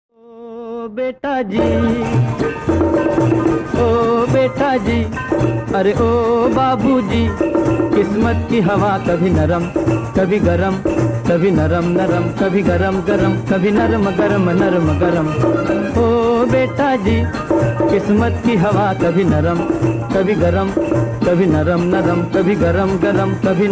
Movie Song Ringtone; Ludo

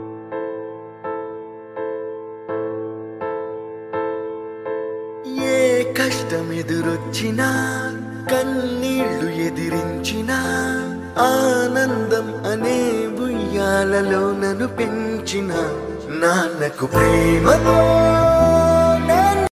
Telugu Song Ringtone

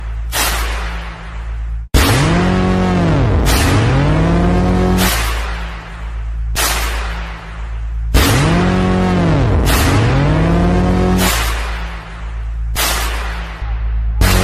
Background Music Ringtone; Kabir Singh

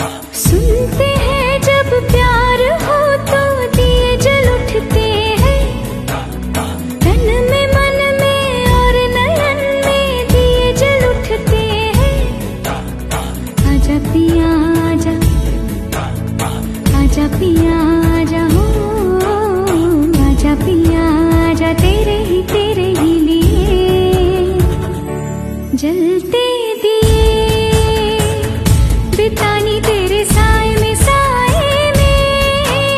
New Romantic Hindi Song Ringtone

